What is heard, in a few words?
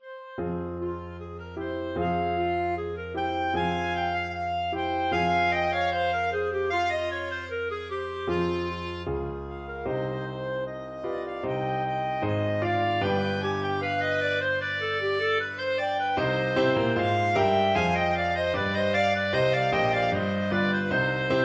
clarinet duet